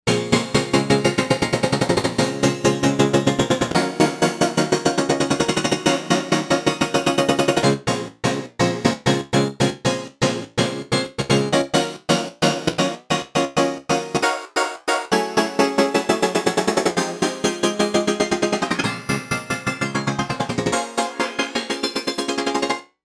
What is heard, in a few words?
Chord Bounce; Novation UltraNova; Factory Patches